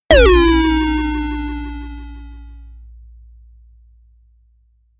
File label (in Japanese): ぽよん音; 効果音se集